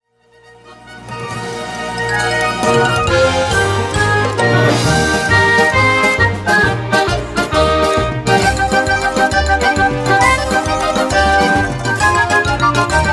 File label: Scary Pirate Overture